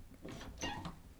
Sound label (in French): porte